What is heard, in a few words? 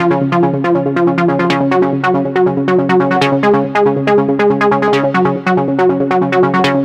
NRG Arp, trance